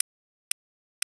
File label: Burst ref